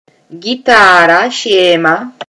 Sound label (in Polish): gitara